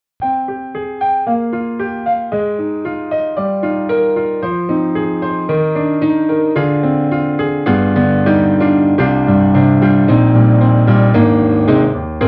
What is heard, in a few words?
Blues Radio Piano